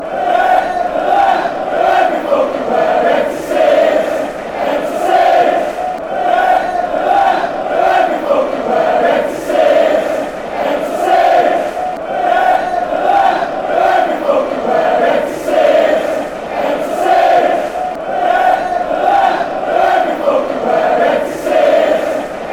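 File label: fanchants, football chants; empty seats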